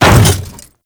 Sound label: Hammer Boss Step Default, Footsteps; Warframe; Grineer